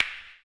CLP, CLAP; ILIO - Ethno Techno

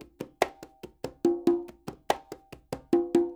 NYC Percussionworks loops; Sampleheads

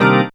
organ, c, Garage Organ; Dance Synths

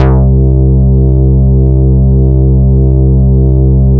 Ultimato Electro C3, Synth Hits; Zero-G; Soundclash Nu Dance Electro; Acidized

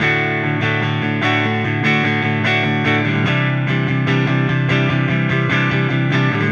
Silos Clean Strum Guitar, Indie Rock

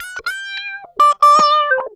SQUEEZER, Funk Guitar; 90 s Sample CDs; Zero G

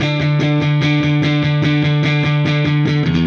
Silos Dirty Strum Guitar, Indie Rock

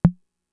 Tom, Fricke MFB-522

